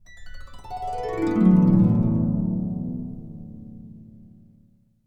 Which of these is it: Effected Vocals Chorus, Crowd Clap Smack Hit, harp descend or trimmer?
harp descend